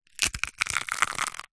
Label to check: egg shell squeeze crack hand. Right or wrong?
Right